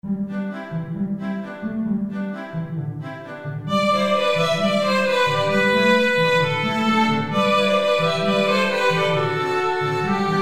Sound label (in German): streichquintett